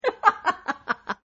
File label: Witch Laugh, Female Witch Laugh; Evil Laugh Sound Effects; Horror